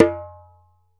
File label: ASHIKO, African Perc